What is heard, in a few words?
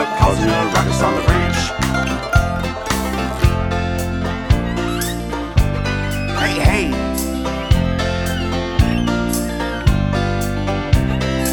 Instrumental